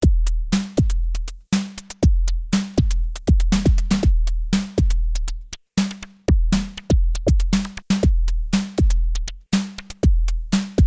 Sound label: korg dw-8000 sounds beat